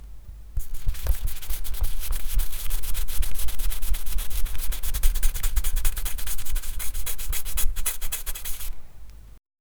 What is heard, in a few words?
brush applying acrylic paint, painting